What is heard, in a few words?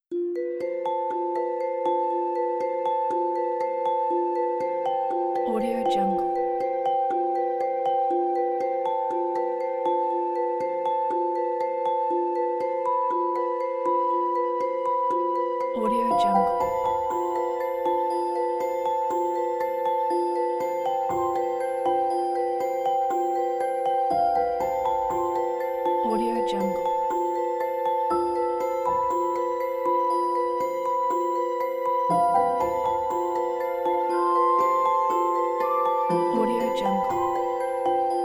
magical fantasy; mylesproductions